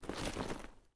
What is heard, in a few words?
gear stereo; Escape from Tarkov